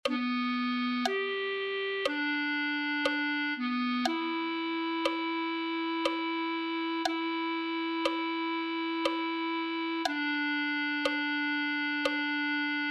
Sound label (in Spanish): clarinet1